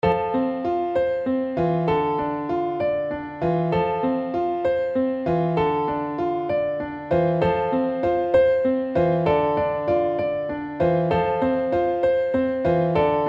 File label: Piano Trumpet Bb